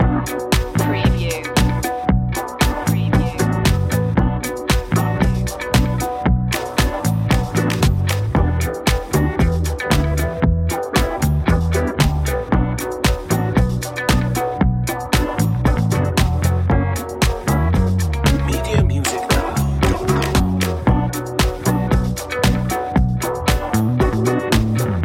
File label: friend zone loop, bitter